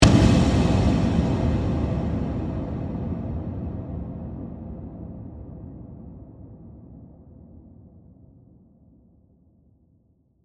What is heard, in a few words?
Hit Dark Airy, Horror; Production Element, Imaging Element, Accent, Transition